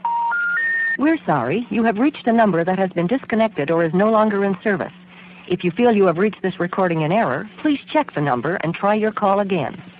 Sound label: bell intercept